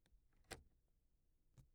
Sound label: Lock